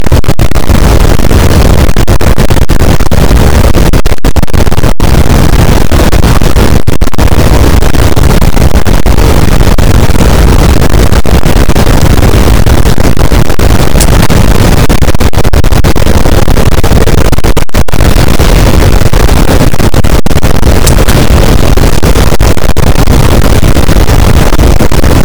cachalot positif, boat; Physeter Macrocephalus

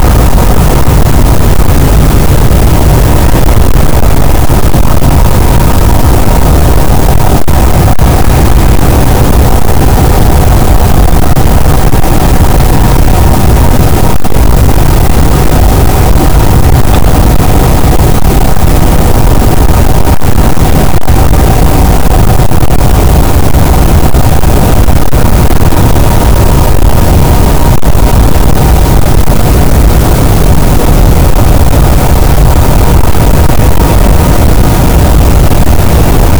cachalot, gaussian noise; Physeter Macrocephalus

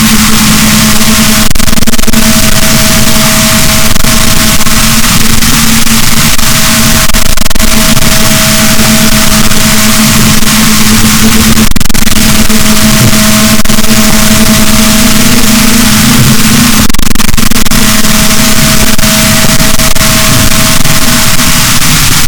rorquals, boat, Balaenoptera Physalus; SNR 6dB

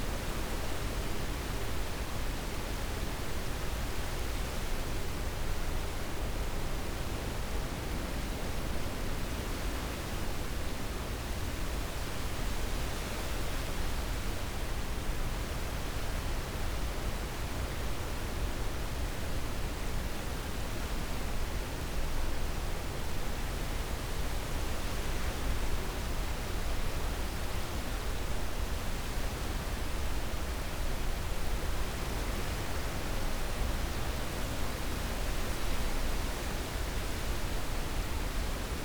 cachalot negatif, Physeter Macrocephalus

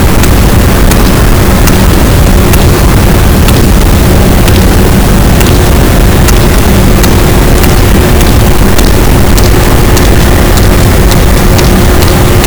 cachalot positif, boat, Physeter Macrocephalus

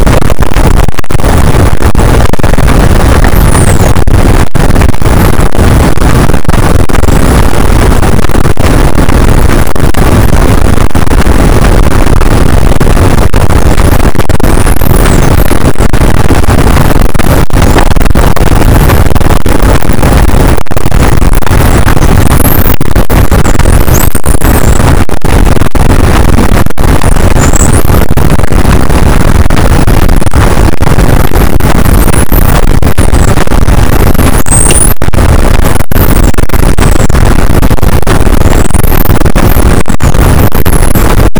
cachalot negatif, boat, Physeter Macrocephalus

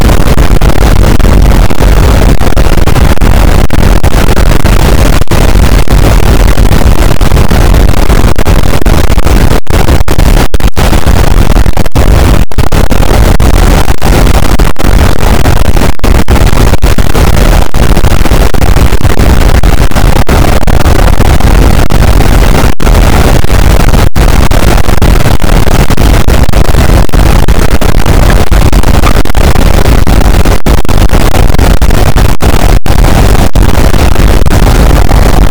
cachalot negatif, boat, Physeter Macrocephalus

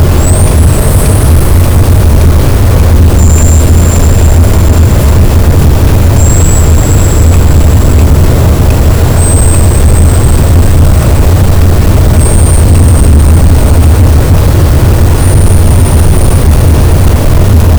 cachalot, Physeter Macrocephalus, gaussian noise

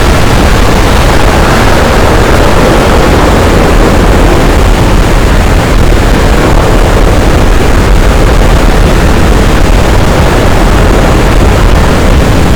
cachalot, boat; Physeter Macrocephalus

